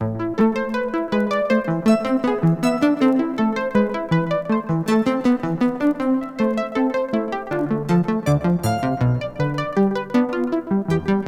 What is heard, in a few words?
Two-Part Invention in A Minor